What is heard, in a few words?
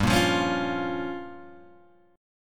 G Minor 7th Flat 5th, Guitar, Open G; chordshape; down